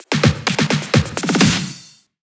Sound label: EDM Drum Fill; FL PRO